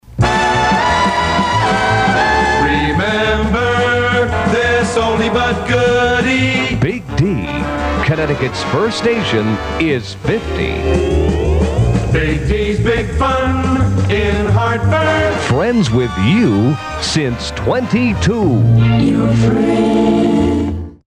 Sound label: PAMS jingles; PAMS Jingles #2